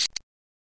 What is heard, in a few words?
air turret active loop; hl2